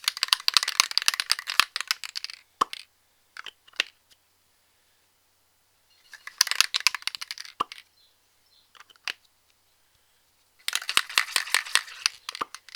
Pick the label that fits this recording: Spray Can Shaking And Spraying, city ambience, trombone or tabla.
Spray Can Shaking And Spraying